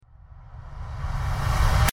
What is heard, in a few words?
dramatic buildup